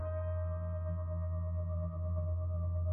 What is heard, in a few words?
android notification